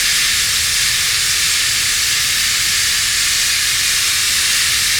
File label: amb steam; ambient; atmosphere; Counter Strike Model Pack; CS:GO